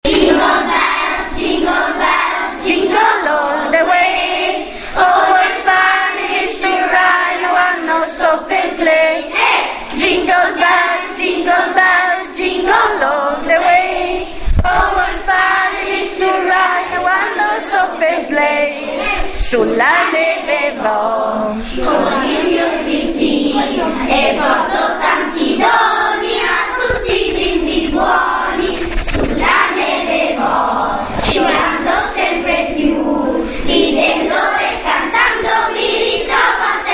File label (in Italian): Libera Interpretazione Di Gingle Bells; Scuola Budoia; Natale 2012